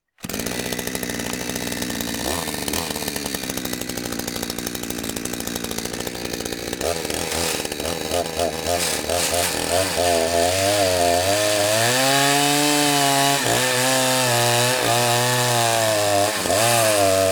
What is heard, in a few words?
saw